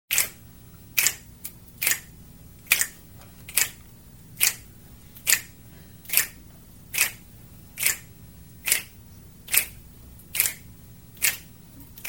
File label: snapping fingers; SOUND EFFECTS1